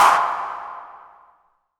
IMP SNR DRUM, Drum, Cinematic Impact

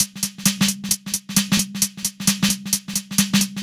132 Perc, Drum Loops; Zero-G; Soundclash Nu Dance Electro; Acidized Wavs; 132bpm; Plastic Man

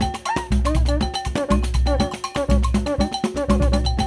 RIO, Rio Batucada

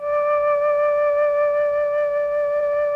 MLTRON FLT, MELLOTRN; Sonic Reality; Vintage Timetraveler

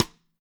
Drums Percussion, Kit1 Room Mic

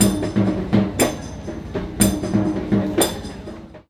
PERC, India; Best Service; Ethnic Journey Sound Cube

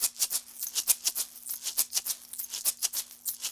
Seed - Gourde, Ethnic Percussion; Sonic Foundry (Sony Creative Software); Ambient Atmospheres & Rhythms

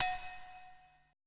E HITUBE, ETH PERC

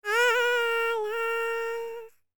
HIMALAYAN, World Voices; ILIO; Vocal Planet VOL-5

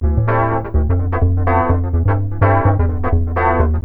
LOOP, Techno Trance; Music Loops

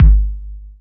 DR. LOOP, DRUMS; X-Static Goldmine 3

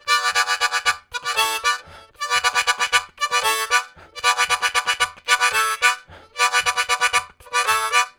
High Chords, Harmonica Riffs; Zero G - Harmonica

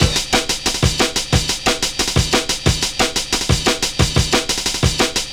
Funk Break, Drum & Bass; Fluid Dynamics

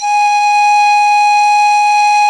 FLT, Sicuri Pipe; Club-50 - Foundations Roland; Flutes +

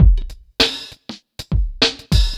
drloop, drumloops, Vinyl Attack; Masterbits

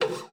GUITAR FX; Xsample VOL-2

